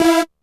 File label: Synth Hit E